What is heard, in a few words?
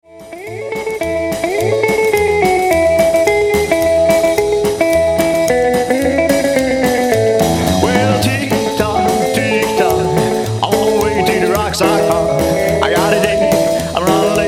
tick tock